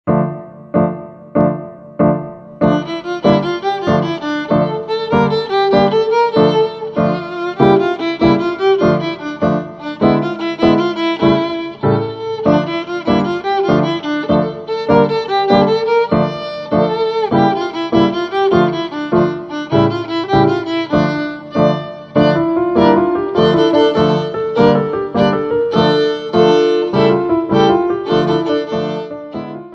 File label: Tarantella